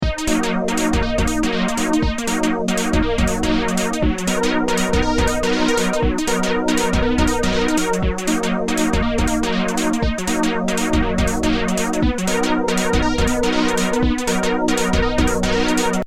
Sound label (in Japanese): arp 交差